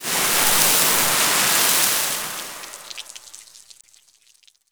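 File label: water pipe burst decay; Explosions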